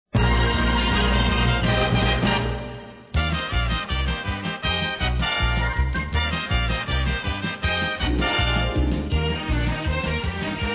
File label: theatre theme; steve wright